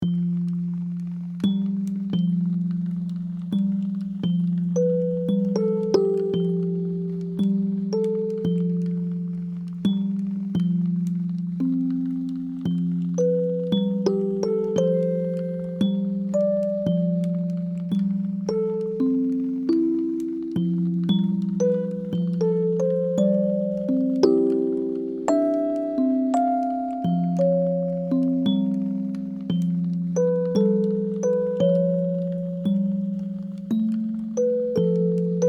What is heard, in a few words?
Mysterious Magic box no reverb